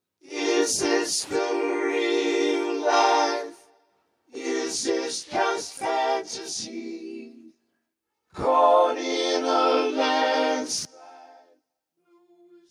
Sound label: harmony engine example